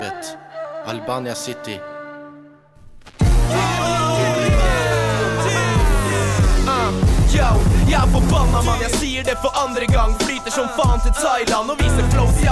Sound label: Albanian rap